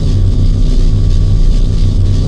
power core, ambience; doomgiver

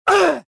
gasp; rebel

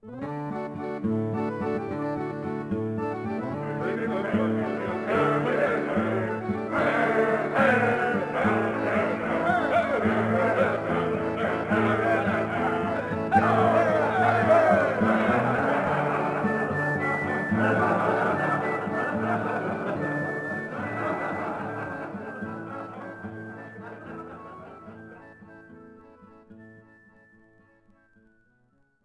sing song; Sid Meiers Pirates; XBOX

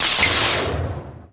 lightsaber hit; weapons

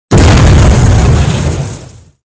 explosion metal stress; weapons; Soldier Of Fortune II Double Helix; XBOX; explosions

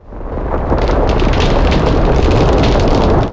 tiki crak; hawaii

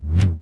pike swing; weapons

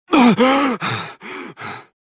gasp; Star Wars Jedi Knight II Jedi Outcast; XBOX; mp generic male

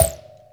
Volume Adjust

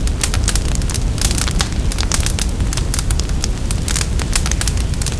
Fire; Star Wars Knights Of The Old Republic II; XBOX

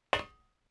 metal walk, footsteps

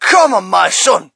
crowd, shout; Mike Tyson Heavyweight Boxing

